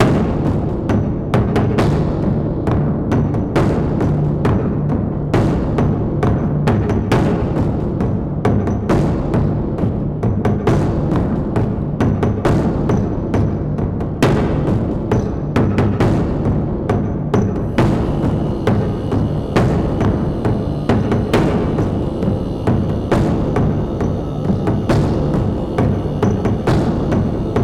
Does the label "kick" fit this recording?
No